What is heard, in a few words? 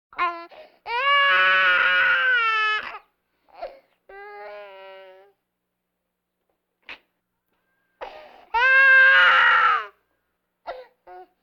Vocal effects breathing Moaning Baby